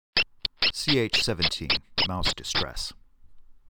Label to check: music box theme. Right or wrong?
Wrong